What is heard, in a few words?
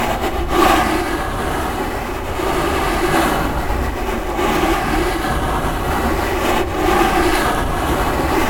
Scrape Glasse Medum Loop; Puddle; puddle plus ios gamerip 2018